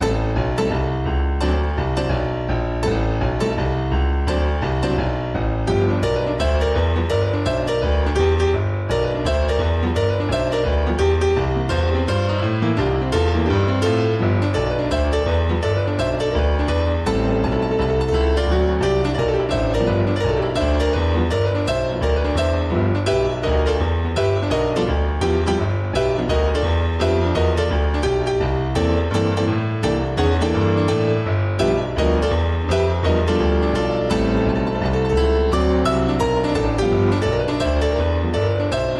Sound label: Vamp It Up, Piano